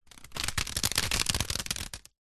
water drips sudden thin plastic wrap; nature

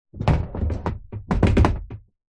wood hollow panel wobble rattle on base; foley

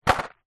industrial timber screws box 16mm drop carpet

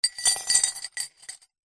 cutlery items handful set down into glass; household; zapsplat

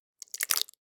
horror guts bloody splat squelch in plastic bowl; sound effects one

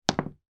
sport miniture snooker pool ball drop on table

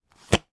credit card slide out of wallet; foley

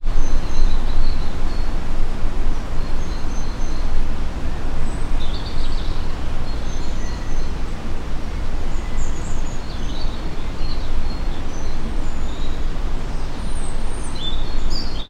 ambience nature birds river; sound effects one

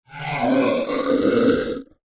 science fiction alien growl

